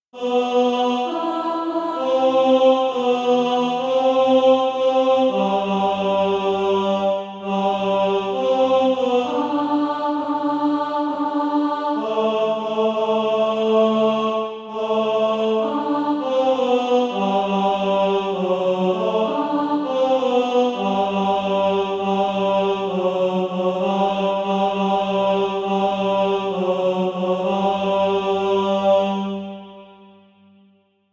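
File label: tenor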